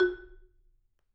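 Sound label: F#, Marimba dead note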